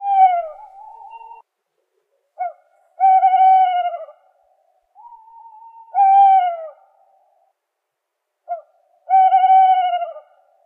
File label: ambience owl; everness